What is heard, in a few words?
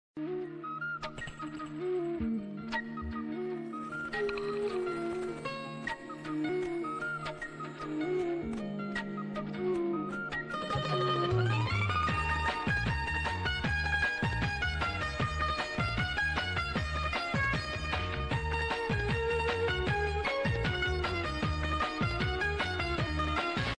bgm ringtones telugu bgm ringtones south indian bgm ringtones; dhairyam movie